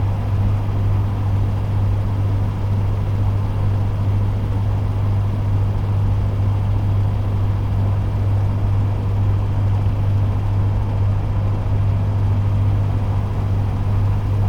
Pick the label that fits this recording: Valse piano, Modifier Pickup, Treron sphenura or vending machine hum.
vending machine hum